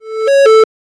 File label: a video ending sound